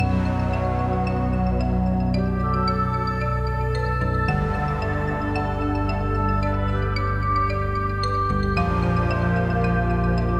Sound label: Mysterious Loop; Mysterioussim V2 Loop